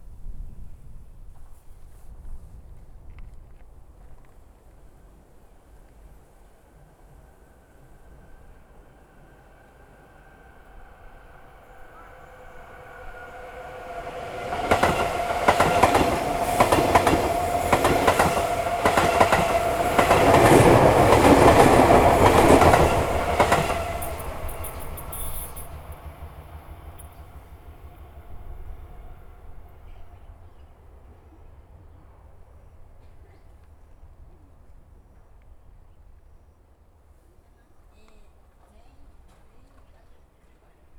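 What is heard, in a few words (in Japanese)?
Stereo, 電車通過; RODE NT-SF1をステレオに変換し; ZOOM F6 MultiTrack Field Recorder; サウンドフォージでノーマライズしました。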